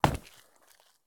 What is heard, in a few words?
landing asphalt; Escape from Tarkov